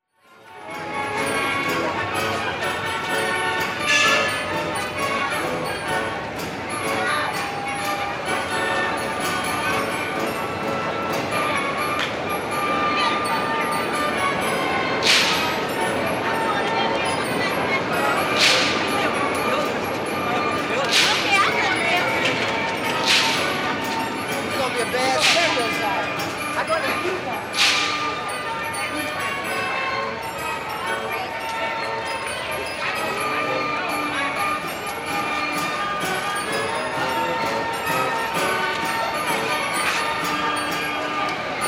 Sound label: Carnival Midway; SOUND EFFECTS1